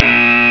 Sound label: error